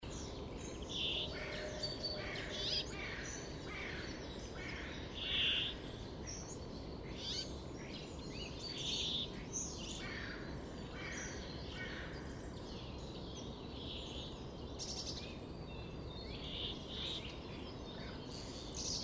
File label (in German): Bergfink; Landshut; Susanne Rieck